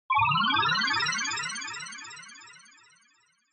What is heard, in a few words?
Scene Change Delay Slow